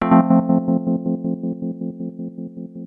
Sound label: wurl del, dx7